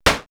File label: Switched, synthfx; X-Static Goldmine